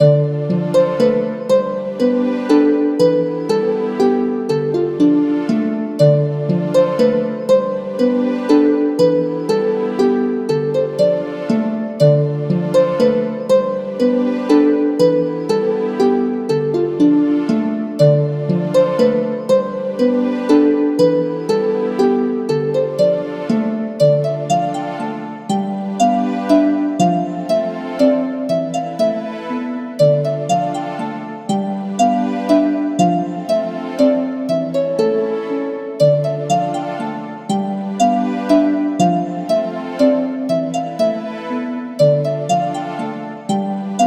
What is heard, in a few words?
Church; android